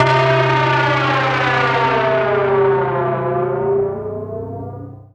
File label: FX SWEEP